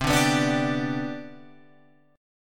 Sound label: C Major 7th Suspended 2nd C down Guitar Open F, chord; CM7sus2 strummed; JGuitar